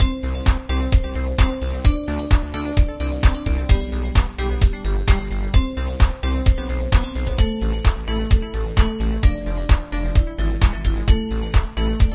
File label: Drumming Sticks, Music Loops; Kenney